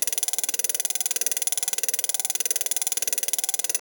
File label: loop knitting sound; craft